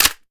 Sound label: reload xmag mag in; gmod